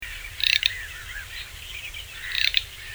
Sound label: Anas querquedula